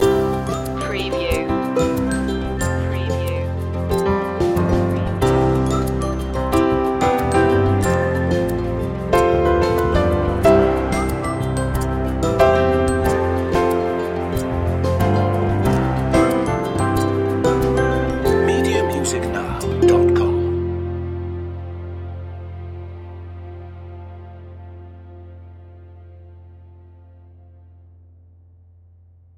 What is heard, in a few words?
the toy box